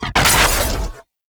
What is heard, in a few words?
Arch Gun Primary Beam Shot; Warframe; Archwing; Hildryn